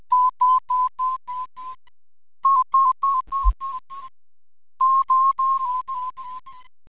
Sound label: sensor sweep